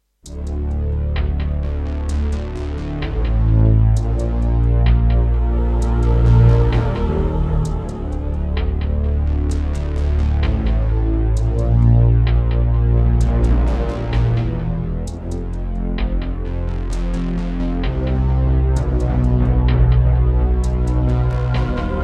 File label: Roland SC-88 Pro 55MAP; Descent II